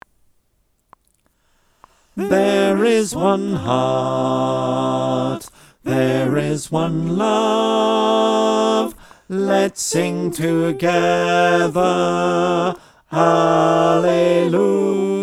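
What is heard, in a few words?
bass, choir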